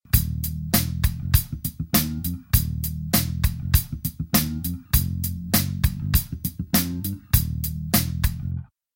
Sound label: Instrumental